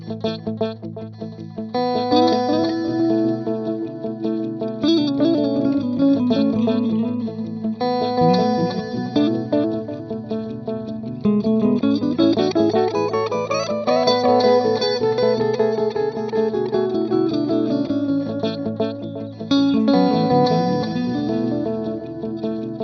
Looper FX Send

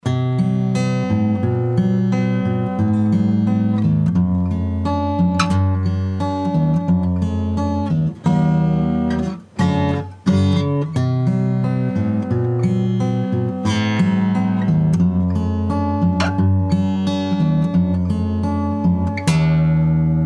Alvarez Picking

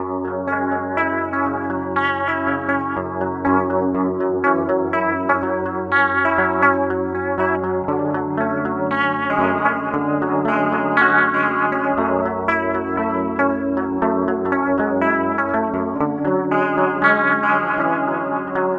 Rotary; Electro-Harmonix; Mod 11

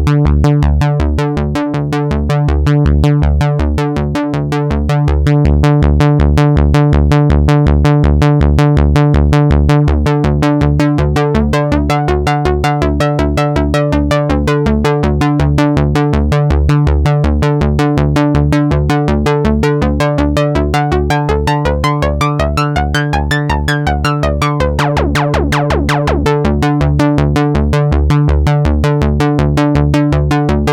MonoPoly Sequence

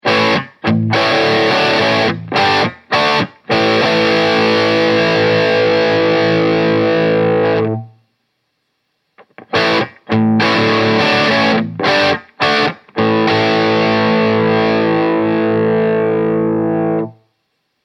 Crunch Tube Stages

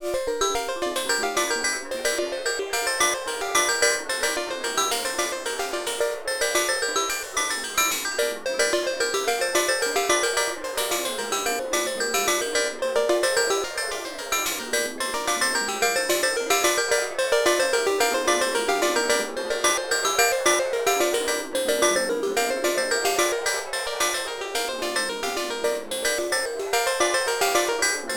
Crazy FMish Bells; Freds Lab Töörö

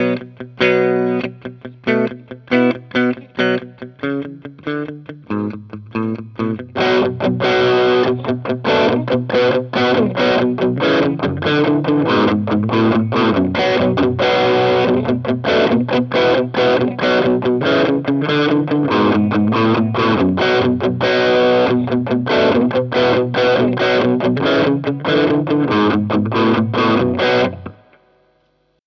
Boss OD 200 Overdrive

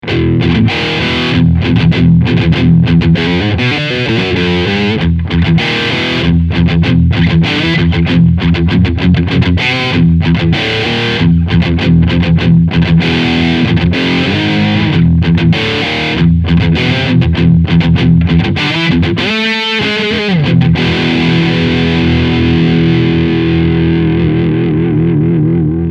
Scooped Riff

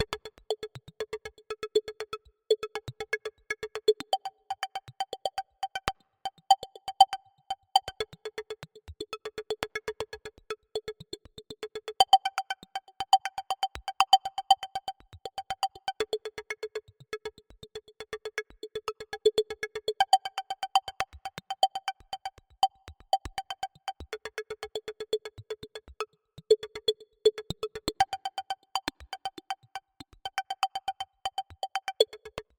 WAVES Element 2 Percussion

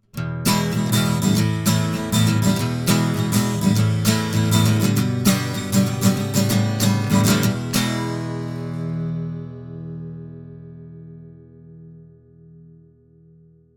Warm Audio TB12 Acc Gtr x18 clean steel

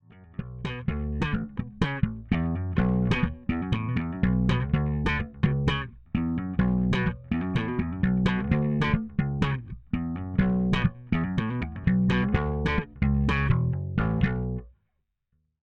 oldschool slap

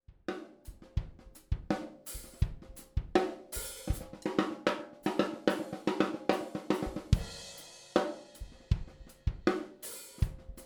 Tascam DR 44WL Stereo Mics SD BD Mic

